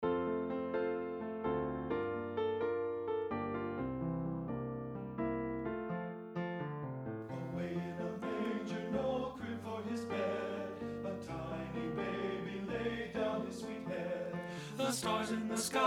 CALYPSO TEN

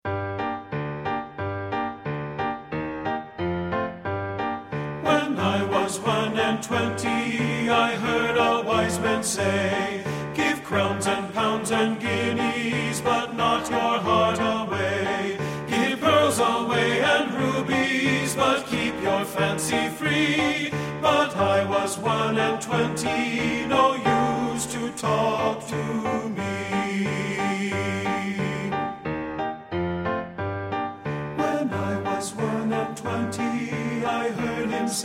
When I Was One and Twenty TENOR

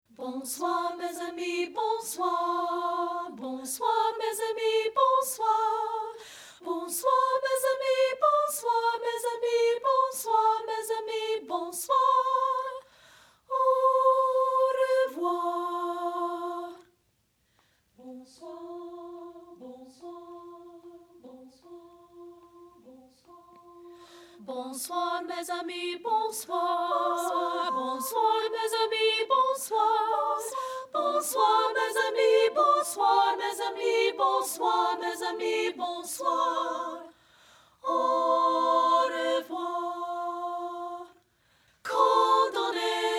BONSOIR SOP 1; THREE A CAPPELLA FOLK SONGS

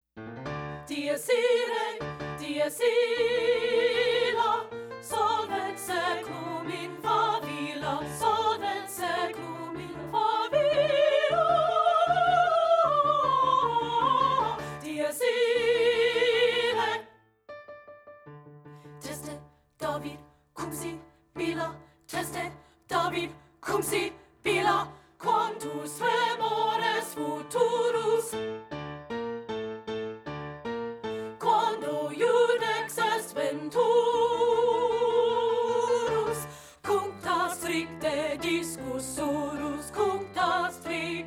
DIES IRAE SOP

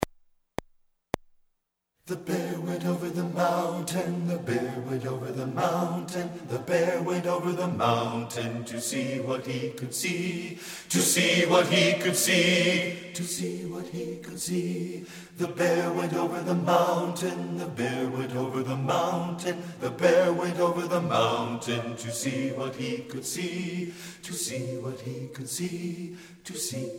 The Bear Went Over the Mountain BASS

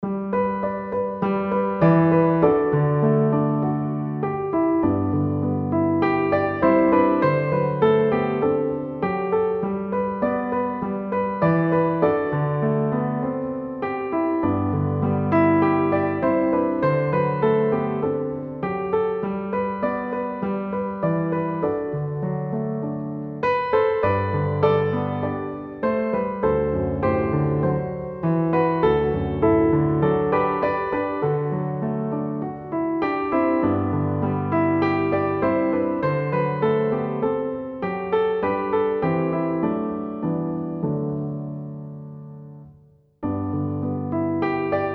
MY SINGING BIRD ACC

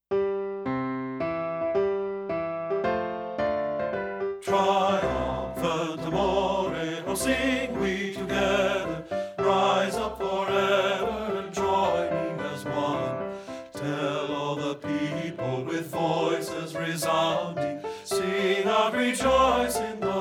TRIUMPHANT AMORE TEN

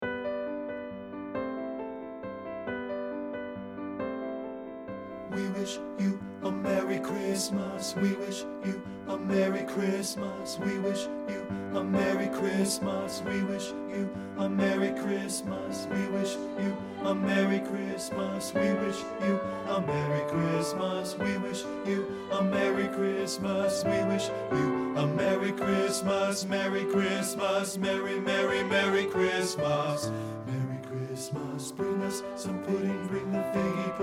WE WISH YOU A MERRY CHRISTMAS BASS

